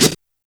scratch; Roland R-70; Perc

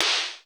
noise; 90 sSampleCDs; Crypton - DrumBase5000; GARAGE STACK

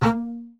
A#3 spiccato, arco bass; Northstar - New Gold VOL-2

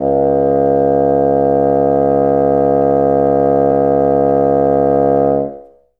C#1, Bassoon; Best Service ProSamples vol.51; Classic Orchestra 2

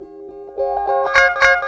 GUITAR WAH A; E-MU Formula 4000 Series Vol. 5; Protozoa; Phatt Presets

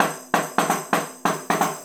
Tambourine

